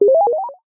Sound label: multimedia game sound classic 1 up